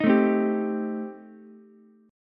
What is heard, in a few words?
A Minor 7th Flat 5th A Ukulele, chord; strummed; JGuitar